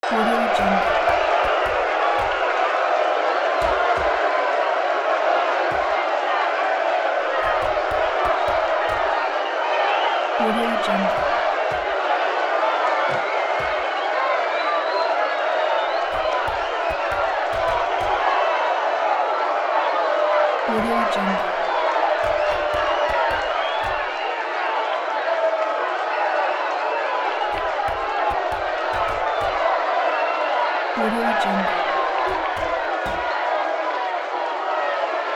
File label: boxing club ambience